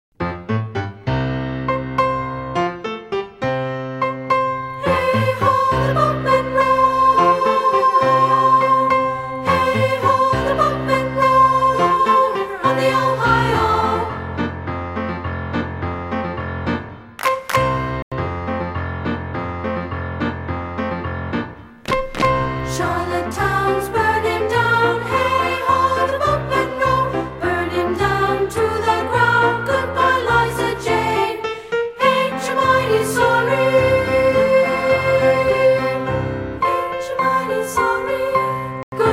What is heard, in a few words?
soprano, choir